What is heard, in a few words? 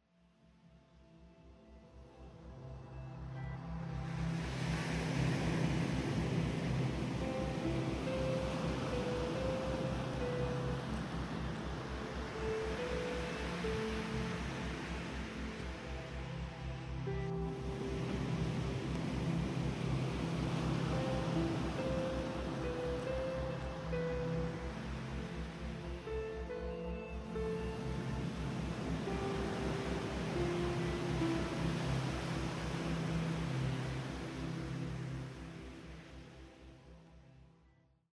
Beach Binaural